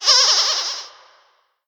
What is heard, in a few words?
shopkeep death; Crypt of the NecroDancer